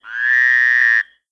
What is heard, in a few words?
small frog